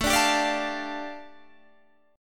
A 7th Suspended 4th Sharp 5th A Guitar Half Step Down, chord